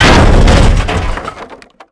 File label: metal wood; World of Tanks Blitz